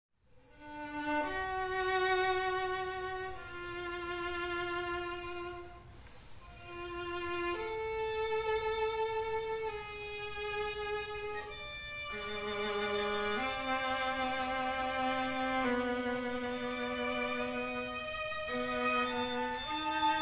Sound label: string quartet